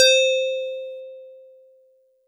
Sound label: Metal Tube DX100 C6